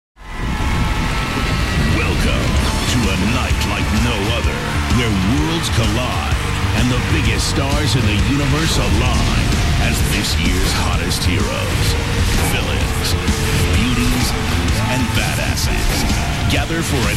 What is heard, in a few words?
scream